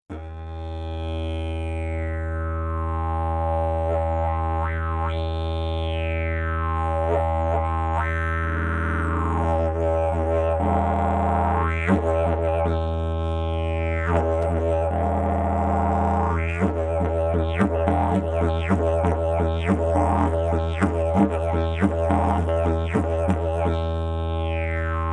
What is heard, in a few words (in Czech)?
jilm jesterka, didgeridoo